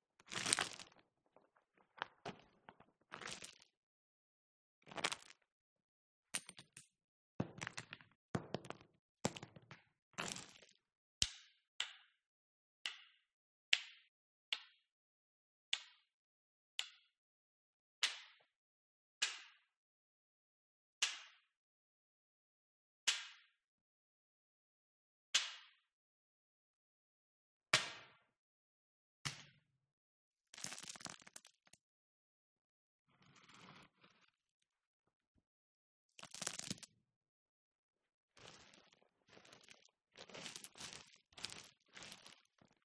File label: foley stones gravel various movements impacts sprinkles int cave natural reverb